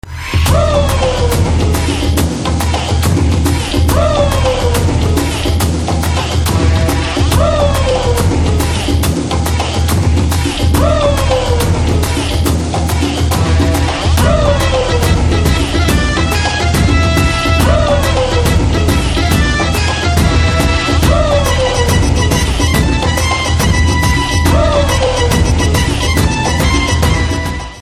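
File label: background music Ringtone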